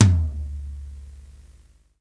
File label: low tom recorded